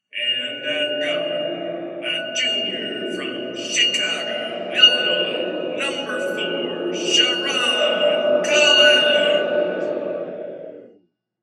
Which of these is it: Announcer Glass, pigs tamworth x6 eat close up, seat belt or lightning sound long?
Announcer Glass